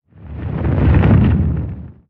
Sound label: Spinjitsu Earth One shot